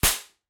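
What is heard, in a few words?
shooter burst gun shot fire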